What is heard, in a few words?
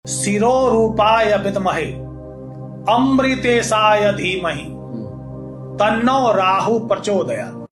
lottery mantra; mantras